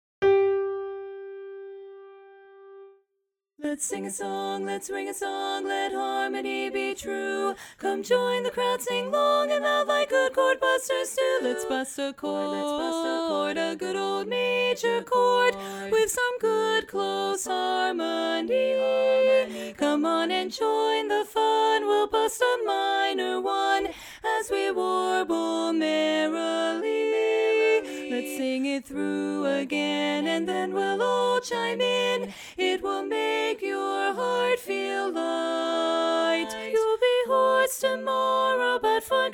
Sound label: lead dominant